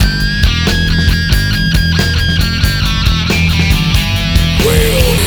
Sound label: band track; Vermin; Sonic Foundry (Sony Creative Software); Ugly Remnants VOL-2